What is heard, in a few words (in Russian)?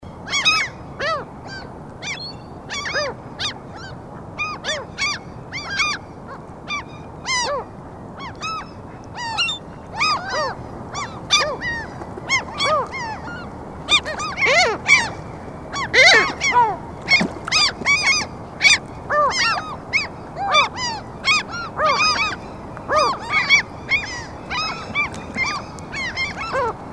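chernogolovaya chayka golos, Mediterranean gull voice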